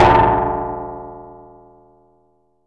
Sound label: BUZ; Masterbits - Total Zone